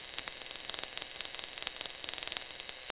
microcrackling electric; digital

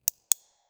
minimalist sound effect a, camera

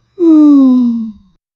soft moan of pain after a fall; emotions

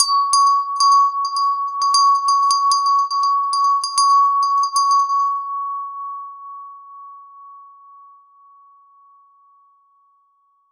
crystal zyther mallet one, musical instruments

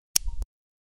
flash light turned on

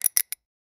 mechanical metal combinat, lock

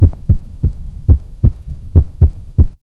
quick heart sounds, heartbeat

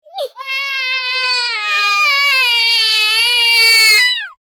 a child crying loudly; emotions

